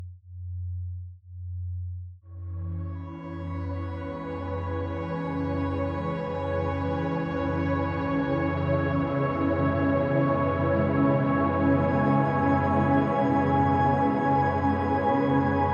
BB 1Hz mit Ambient Sound, binaural; Delta; Tiefenheilung

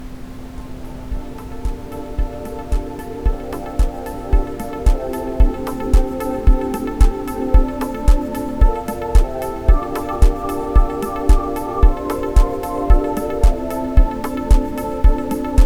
mit Ambient Sound; chakren; Muladhara Stabilitaet

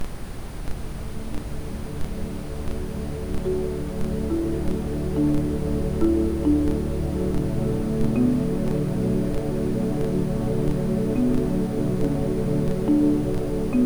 Rife Mondlicht Wiegenlied mit Ambient Sound; rife frequenzen

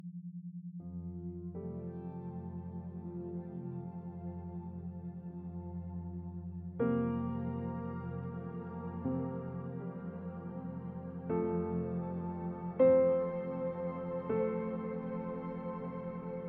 BB 10Hz mit Ambient Sound, binaural; Alpha; Erweckung

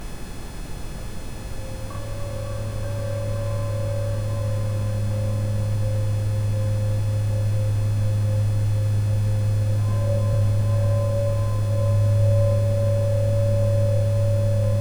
Rife Balance Balken mit Ambient Sound; rife frequenzen

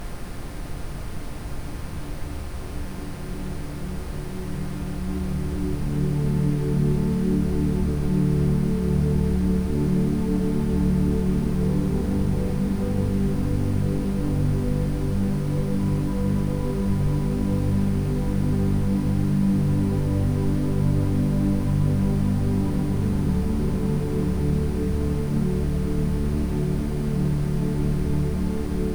Rife Strom der Stille mit Ambient Sound; rife frequenzen

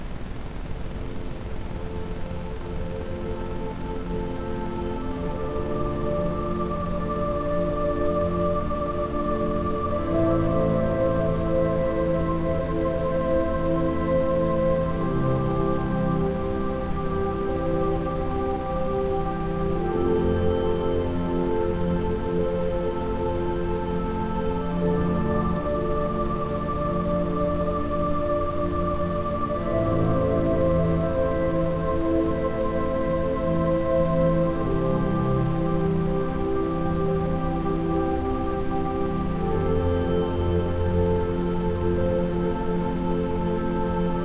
Rife Dynamo Dash mit Ambient Sound; rife frequenzen

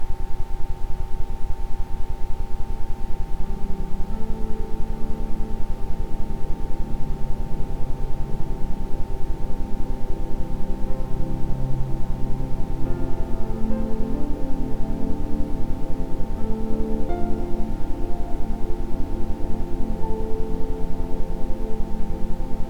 Iso Frequenzmeditationen Telepathie Quickie mit Ambient Sound, Isochrone Töne

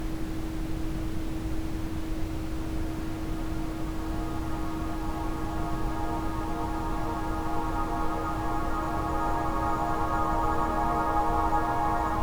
mit Ambient Sound; chakren; Manipura Selbstbewusstsein

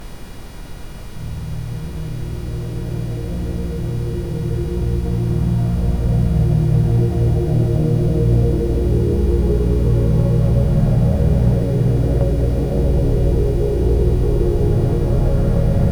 Rife Kronen Verbindung mit Ambient Sound; rife frequenzen

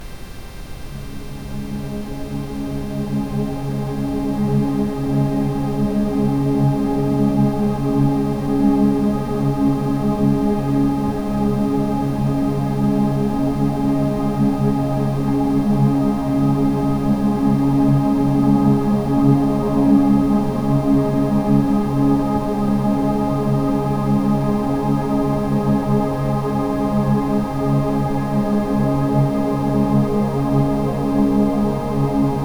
Rife Wellness Welle mit Ambient Sound; rife frequenzen

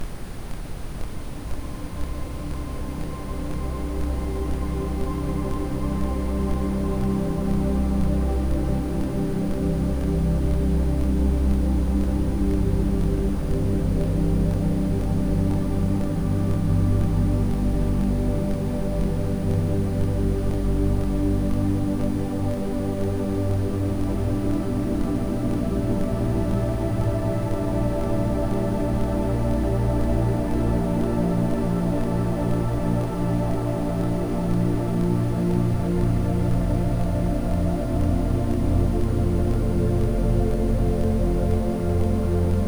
Rife Nacht Nirwana mit Ambient Sound; rife frequenzen

